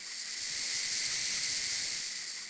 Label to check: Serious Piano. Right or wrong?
Wrong